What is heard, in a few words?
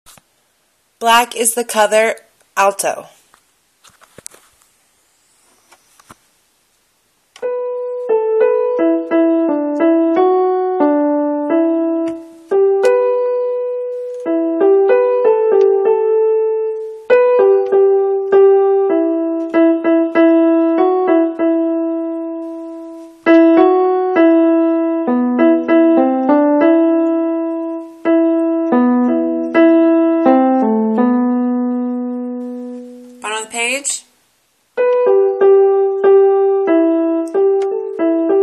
alto